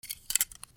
CLICKS

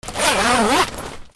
RIPPING

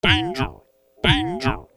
CARTOON

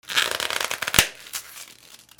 BREAKING

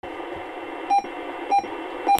PHONES

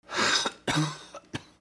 PEOPLE

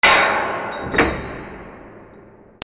BANGS

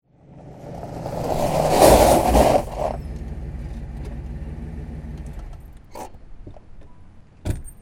CARS

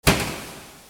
INDUSTRY

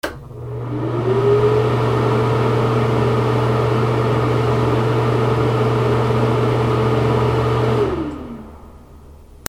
BATHROOM

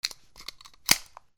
WEAPONS

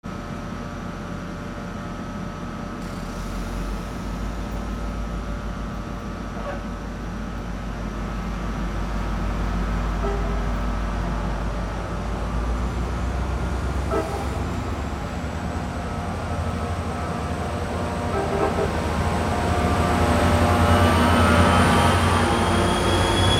TRAINS

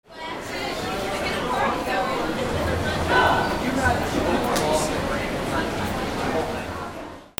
AMBIENCE

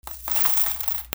MONEY

